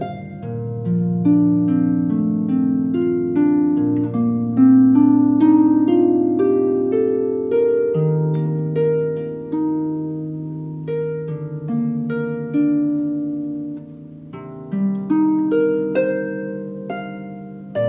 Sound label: harp